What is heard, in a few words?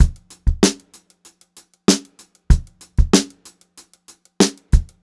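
STRAIGHT BEAT, Funk-Groove Drumloops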